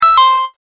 correct; 馬鹿っぽい正解音の高音ver。; 正解の音・ピンポーン

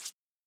Rip Up Paper

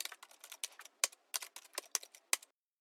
Keyboard

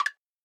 Woodblock

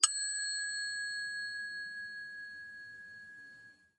bell

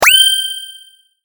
sword

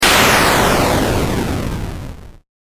bomb

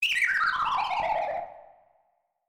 ghost

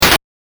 game effect

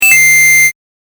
correct; シンプルなピンポンの超高音ver; 正解の音・ピンポーン

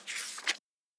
paper

